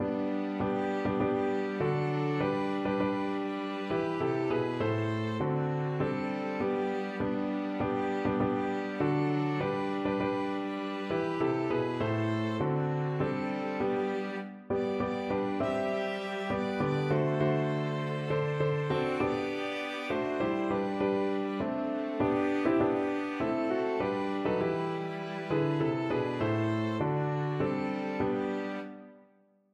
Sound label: christmas tree piano quartet